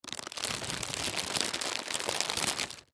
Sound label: Wrapper Crinkle, Plastic Movement; Foley; Plastic Sound Effects; Materials; Blastwave